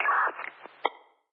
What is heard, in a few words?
Radio